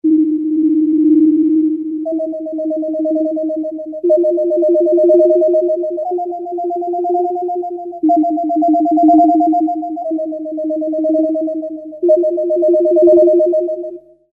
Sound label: Ethnic Line 4/4; mfx chain